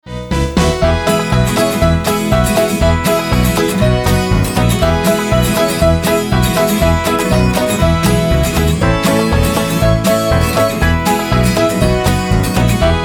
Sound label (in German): Karaoke